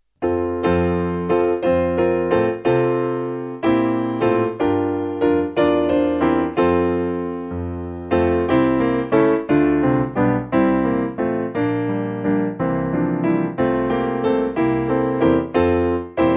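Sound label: Piano, church music backing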